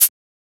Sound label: Shaker; FLPRO